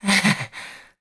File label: Stamina Out; Link out of stamina; Skyward Sword; zelda